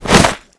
c4 drop dirt; weapons; c4 detpack

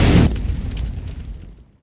hipcutter target end

